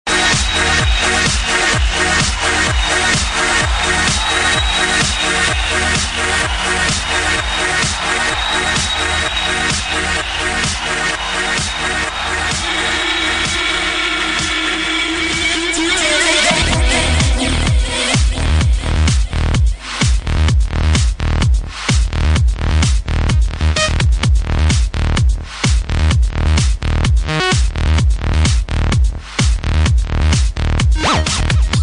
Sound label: bass